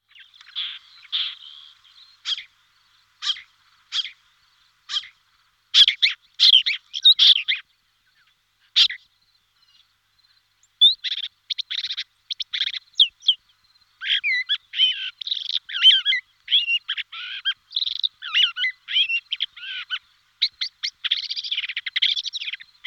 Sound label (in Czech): Tuhyk mensi Lanius minor, nasiptaci